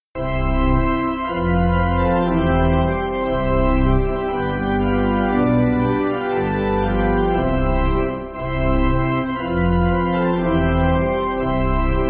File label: Pipe, church music